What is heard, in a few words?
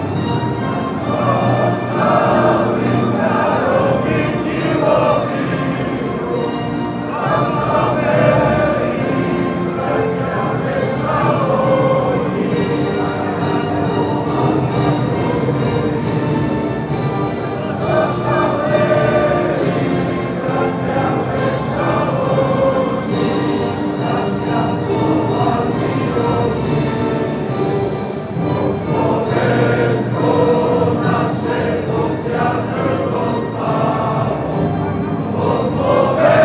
anthem